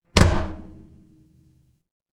transition braam

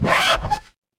transition record scratch

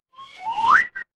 cartoon slide whistle

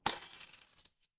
glass shatter

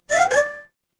ui alert

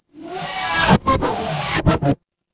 magic scifi teleport

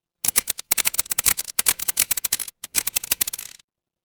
mechanical gear

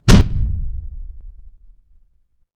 transition impact hit

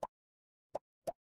cartoon pop

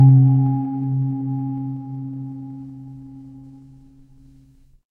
instrument singing bowl

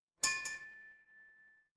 glass clink

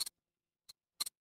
ui timer

